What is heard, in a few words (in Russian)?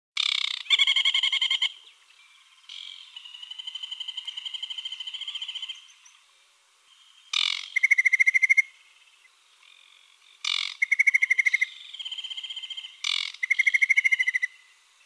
kamyshovka vertliavaia